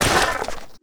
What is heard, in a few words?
PEACE DAMAGE; GRAVELAPIS